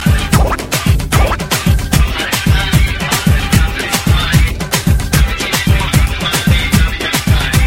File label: Tamil Mix